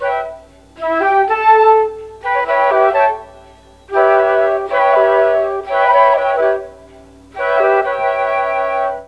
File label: D#3 CHA FLT, Mellotron; Mike Pinder - Mellotron Archive CD